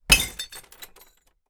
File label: impact glass bottle onto broken glass in trash